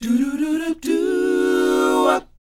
DOWOP G#, Vocal R&B DOO WOP; ILIO; Vocal Planet

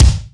HEVY KIK; Northstar; New Gold VOL-2; DRUMS+EFX

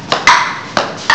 PING PONG; M8; CMI Factory

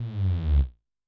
JNG BASS; Yamaha DTXpress-II

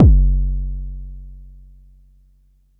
Bassdrum; Jomox Xbase09

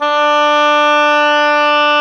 oboe, Oboe Brth

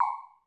Dance Kit, Korg 01W

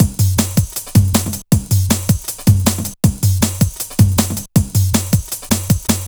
Drum Loops, Jungle, 158; Prolonged Exposure

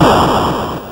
Atari Noise Long, Atari 2600

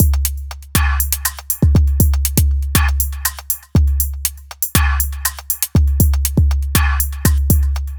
120, Drum Loops; M8; Electronisounds

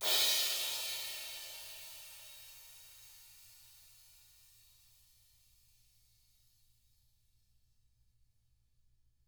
SWISH RIDE; ILIO; Double Platinum Drums 1

